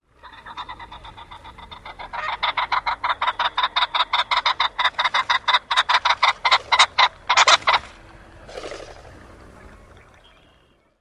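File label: RINEPH flight call, ring-necked pheasant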